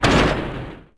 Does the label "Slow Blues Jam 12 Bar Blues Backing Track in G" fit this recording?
No